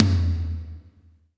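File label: tom, drums; Best Service; Real Mega Drums VOL-1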